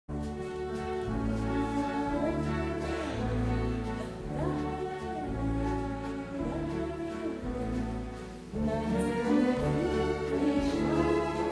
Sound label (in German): Walzer